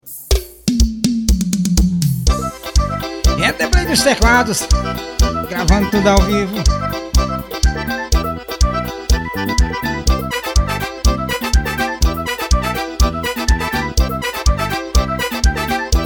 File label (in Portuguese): teclados ao vivo